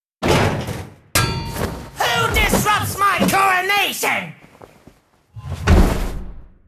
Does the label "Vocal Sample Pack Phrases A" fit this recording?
No